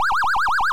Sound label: Maximize; Asteroids